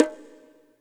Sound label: bongo low